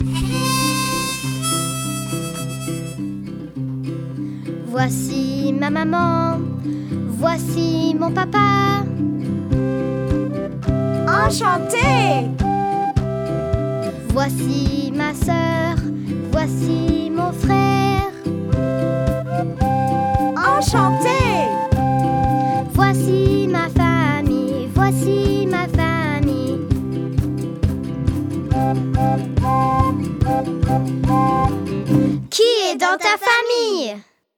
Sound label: song french